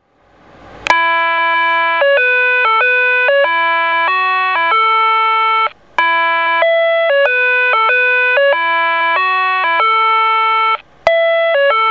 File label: kokyonosora; kyosan LTA-SH1; kurayoshi; kurayoshi ekimae